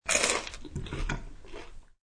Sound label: Bite English Muffin, Crunchy bite down on crisp buttery English Muffin; Eating